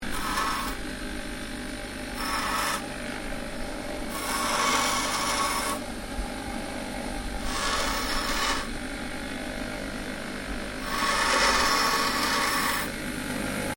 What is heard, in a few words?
Dropsaw Grinder Blade Cleaning, Dropsaw metal cutting; Saws; Machines